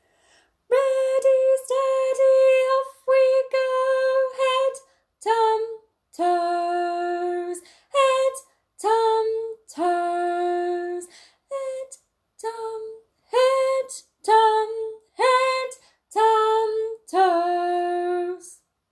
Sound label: head toes song; head tum toes; eyfs and key stage 1